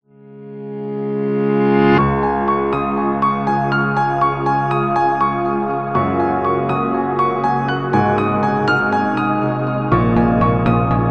Piano Positive Inspiration, corporate music; corporate music vol 14

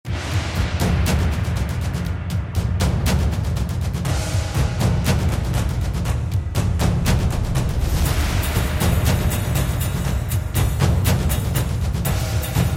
Japanese Drums

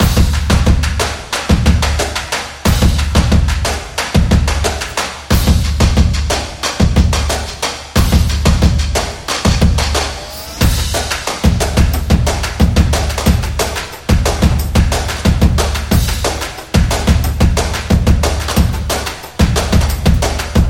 Fast Promo, football music

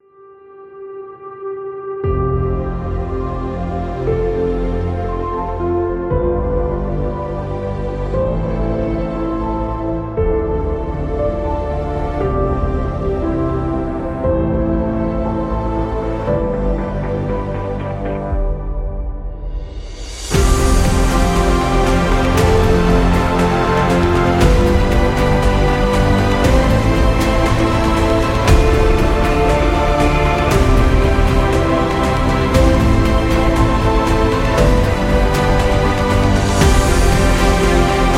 Emotional

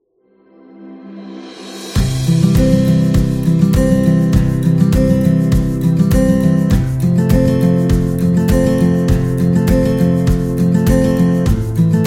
Acoustic Guitar Corporate; corporate music vol 12

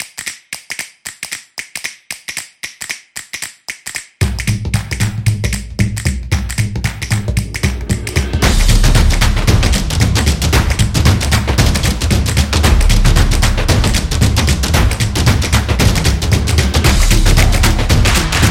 Stomps and Claps

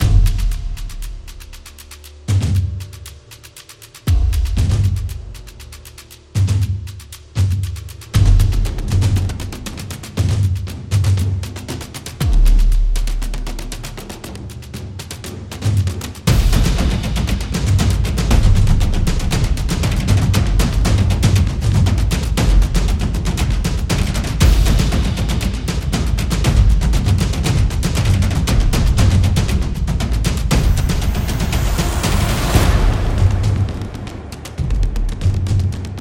The Tribal Drums

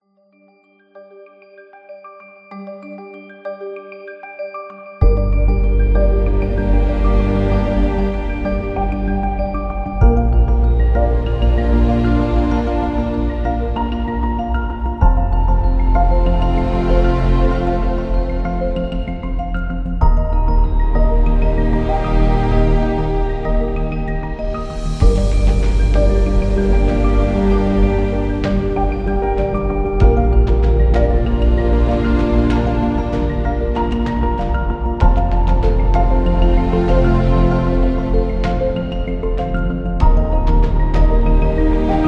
The Documentary; planetarium

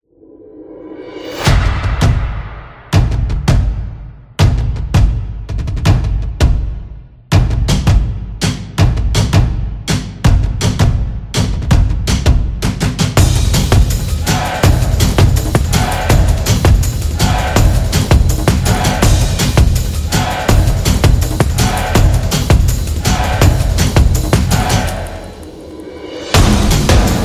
This Is Action Drums; casual game

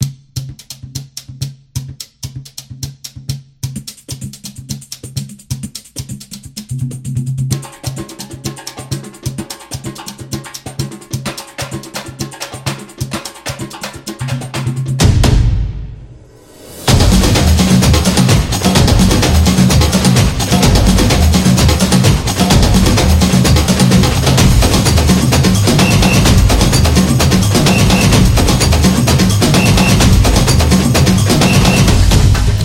Latin Drums

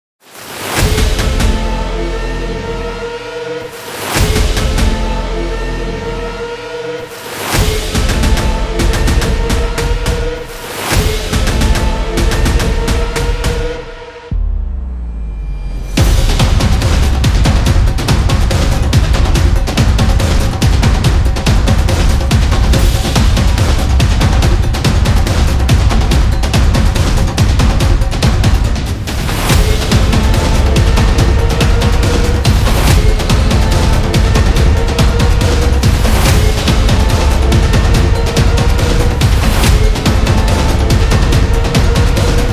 The Sport Trailer

